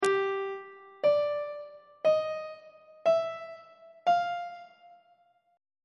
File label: f double sharp 6th intervals; on treble clef